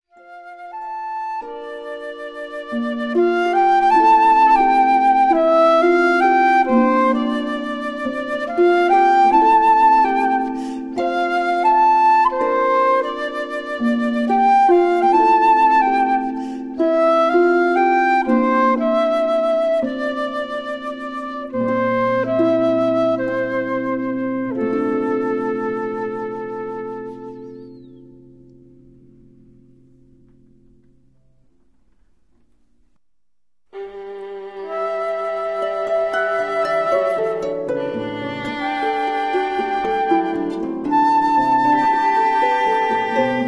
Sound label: Trio for Flute, viola & Harp